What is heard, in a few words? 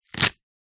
leek slice knife on wooden chopping board